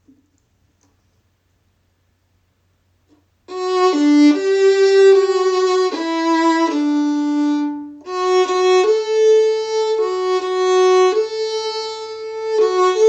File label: Lullaby Melody